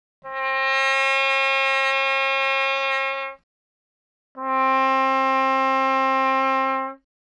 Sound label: Trumpet; 気鳴楽器; LipReed; Trumpet+Cornet